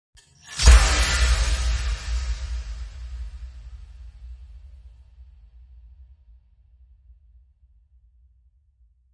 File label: Impacts Abstract Explosion